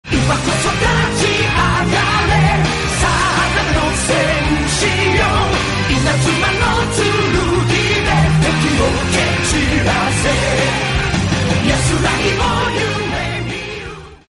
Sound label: GONG